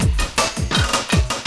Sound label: LOOP, 162 BPM, Drum & Bass